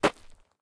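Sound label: gravel, steps; Terrarum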